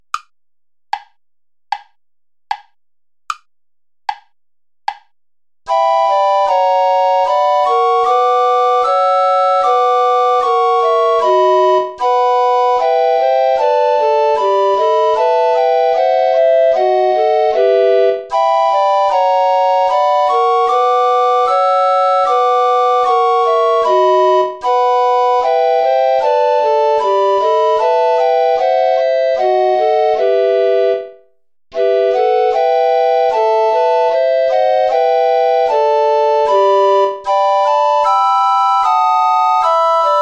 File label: play along without bass recorder; bach johann sebastian; christ lag todes banden